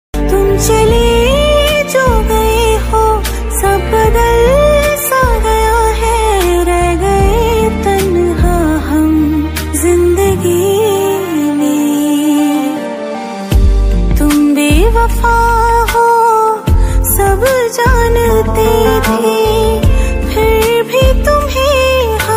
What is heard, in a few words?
Female version hindi ringtone